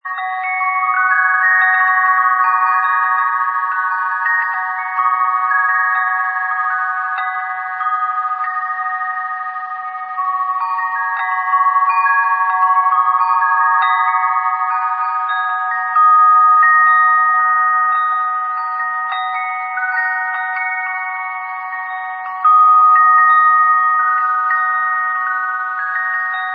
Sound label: lazy day wind chime